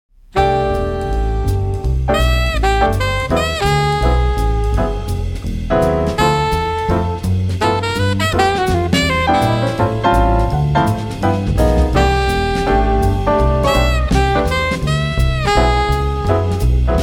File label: quartet